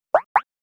Boink double low high

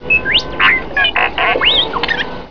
beep whist; star wars

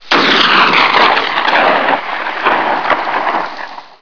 gunshot echo

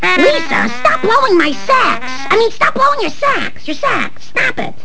blow sax; Simpsons

